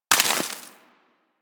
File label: Bullet Impact Debris Snow